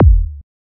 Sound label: Kick